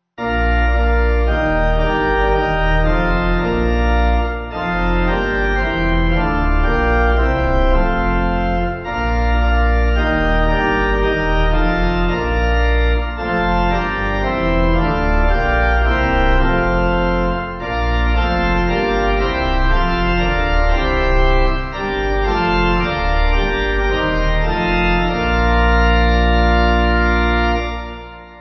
Pipe; Blessed Are The Sons; Voller Wunder

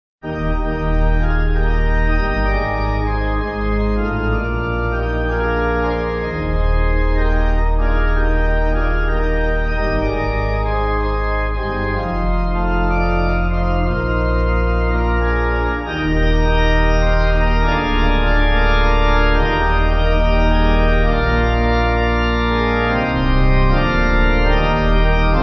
Pipe

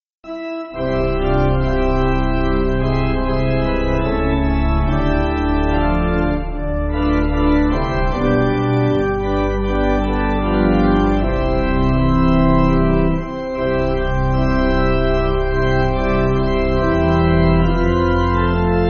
Pipe Organ, Free Music Backing Files for Churches; Russian Composer; You Know The Way

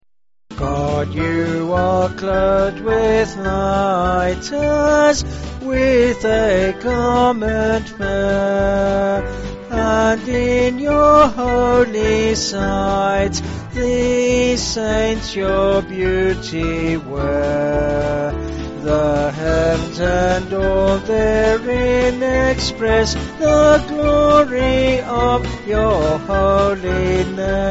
Piano, Band, Pipe Organ

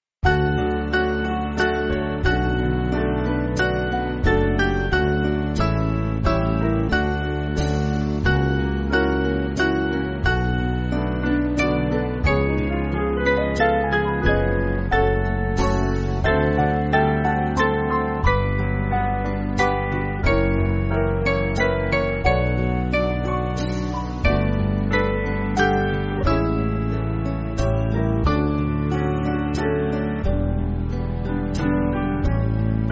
Lord Of Our Life, band; Cloisters

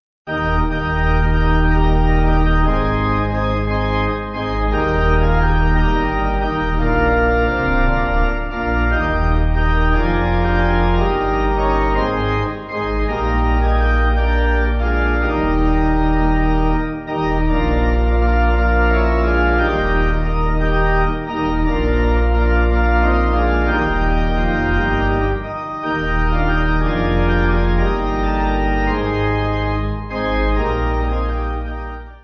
It May Not Be, Pipe; Organ; Rounsefell

